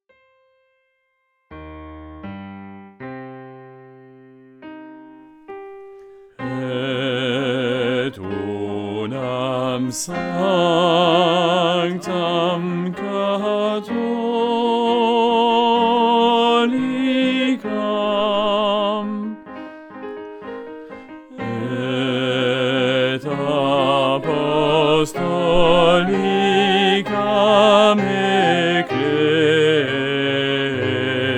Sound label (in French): basse Credo larghetto